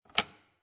Household, Thermostat, Close Plastic Cover, Lid; Furnaces

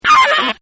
SAX Riff; Horns; Musical; Partners In Rhyme

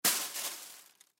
Paper Towel Throw, Paper Towel Throw Into Trash; Materials; Foley; Blastwave

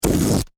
Foley Balloon Expel Air Fast

